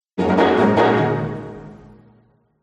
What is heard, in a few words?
ORCHESTRA Jingle Strings Pizzicato Low; MUSICAL; smartsound